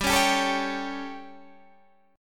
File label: G Suspended 2nd Flat 5th G, chord